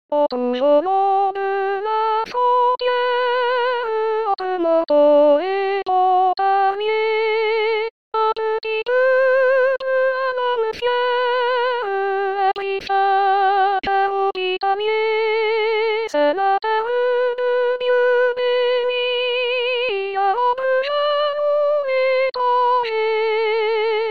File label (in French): la saugette tenor